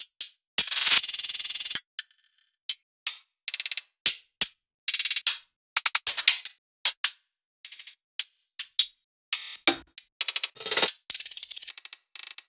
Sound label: Two Shots Hat